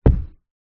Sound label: foley feet jump land carpeted wood floor hard